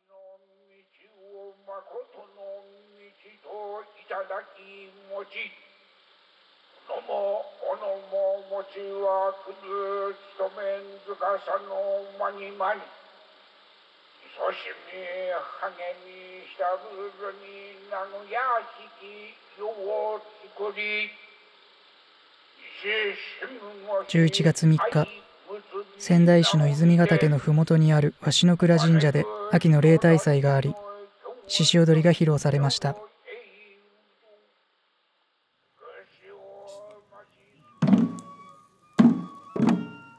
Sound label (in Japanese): 鹿踊り ナレーション 入り; 鷲倉神社の鹿踊り